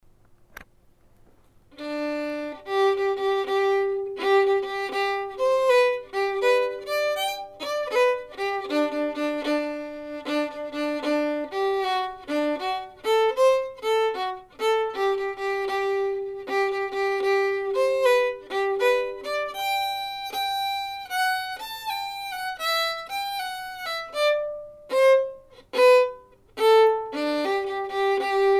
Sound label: Hornpipe, fiddle; Trumpet Hornpipe